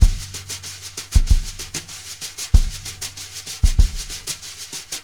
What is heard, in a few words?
BRUSH LOOPS, 95 BRUSH